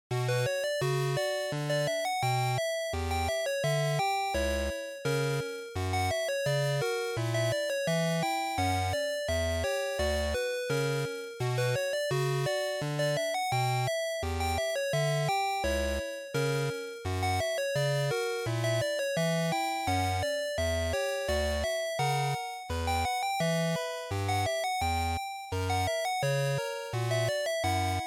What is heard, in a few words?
GB RPG Town3